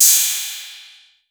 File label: 808, CYMBAL; Wizoo - Platinum 24 Electronic